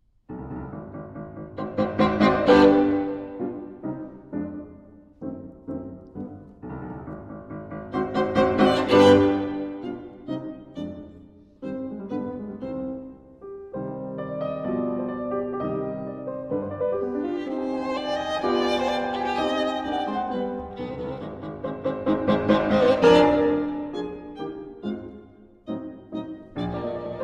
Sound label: Violin Sonata in C Minor, Finale, Allegro